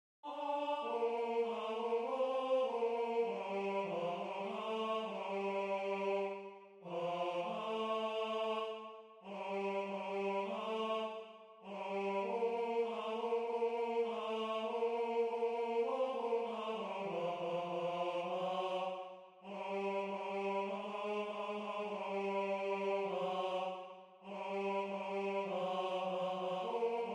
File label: Tenor